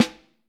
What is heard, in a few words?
Vintage Snare Drum